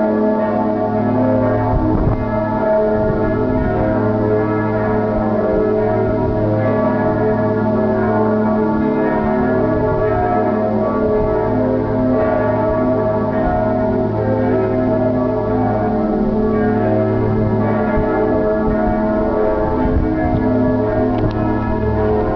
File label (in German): Geläut der Marienkirche in Lübeck mit Nebengeräuschen der Straße; Marienglocken